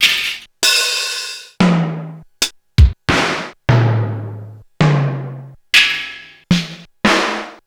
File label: Korg DSS-1